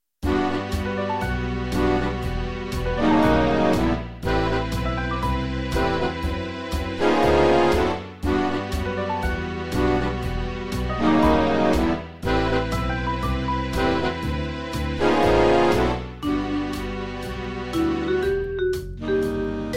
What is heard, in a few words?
big band; Per Big Band; Simbarrangements